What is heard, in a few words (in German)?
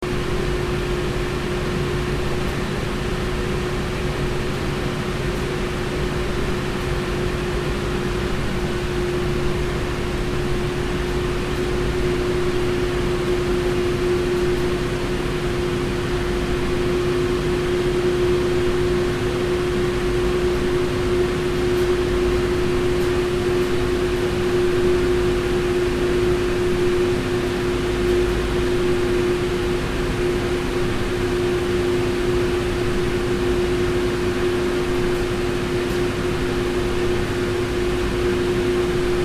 Kino Klimaanlage